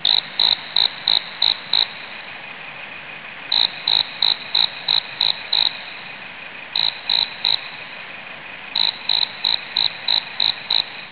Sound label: crickets